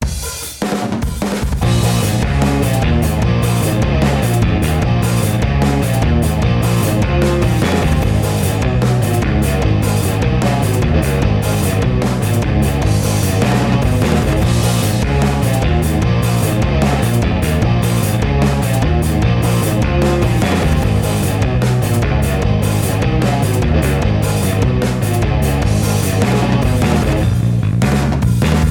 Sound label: opening guitar bass drums